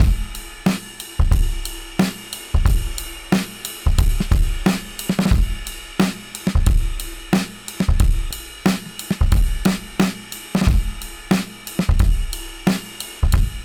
90 drum loop triplets ride blown

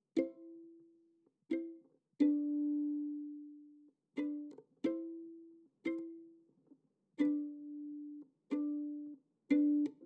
90 C Stem Violin; Opus7

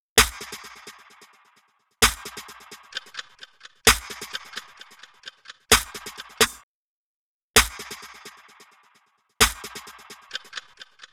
Snare

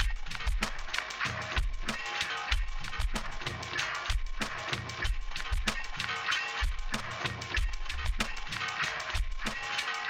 95 Perc Loop

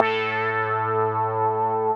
Meow Lead

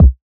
kick one shot; TROPKILLAZ

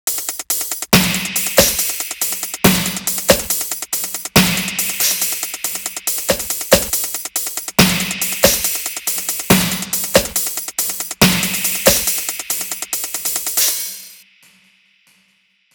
edm loop 140

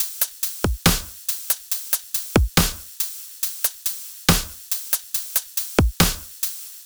Percussion Drumloop 140BPM

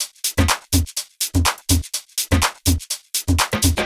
124 Drums Tops

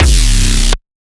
808 one shot Bbm; roar

